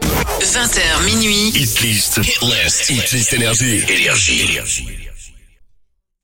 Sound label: Jingle; NRJ